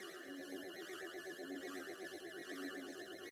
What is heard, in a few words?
Red Alien Loop